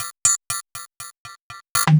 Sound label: Residual Panned Beat; Sonic Foundry (Sony Creative Software); Loop Noir